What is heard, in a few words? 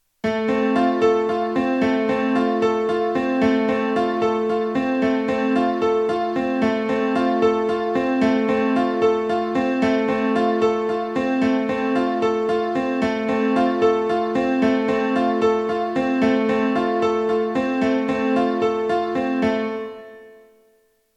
Arpeggio